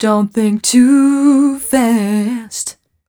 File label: f mel e flat, fem Vocals